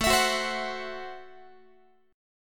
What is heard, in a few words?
A Minor 6th A Guitar; strummed; Am6